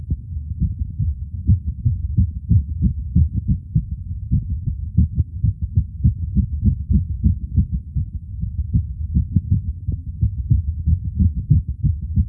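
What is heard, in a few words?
atrial fibrillation and ventricular extrasystoles, heartsound; Arrythmia; Heartsound Library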